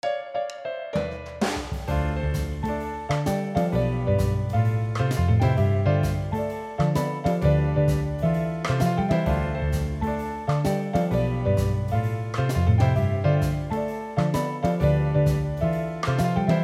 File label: jazz